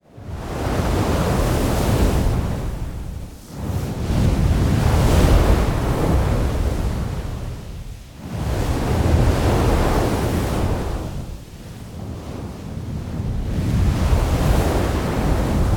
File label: Fire Winds Binaural Swoosh Waves